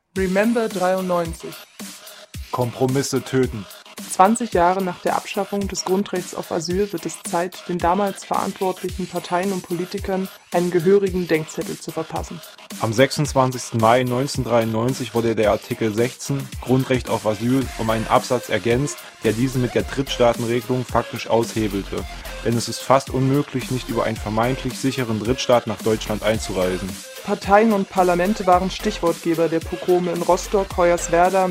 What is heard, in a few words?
jingel deep